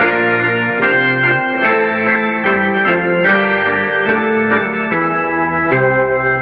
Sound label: keys